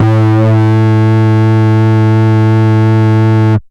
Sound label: Bass B dist A2, drumnbass 170bpm; musicradar; Bass B Multis